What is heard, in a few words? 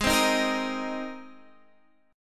Gsharp Major Add 9th, Guitar, Standard; strummed; x,11,10,8,11,11